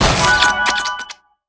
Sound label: chocolate box medium